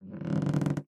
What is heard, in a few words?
boop; mastodon